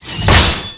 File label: attack